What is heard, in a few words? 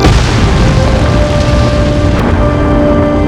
Explosion; Trek